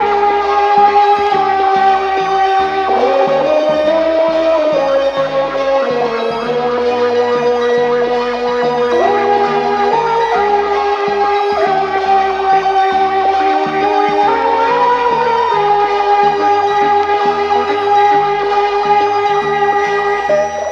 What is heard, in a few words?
wurlitzer; Loops; Electronic